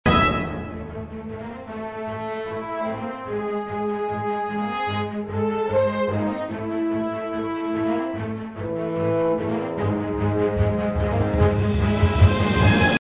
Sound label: mix no reverb; Before; epic orchestral track